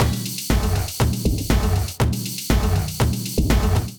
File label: Clinical Kit, drums; Hybrid; Ableton; Factory Packs; Drum Essentials